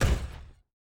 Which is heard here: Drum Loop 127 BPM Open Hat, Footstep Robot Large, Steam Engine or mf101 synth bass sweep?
Footstep Robot Large